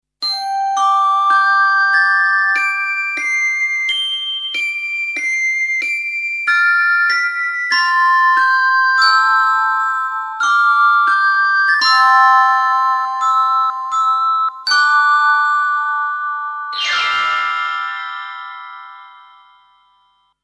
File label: glockenspiel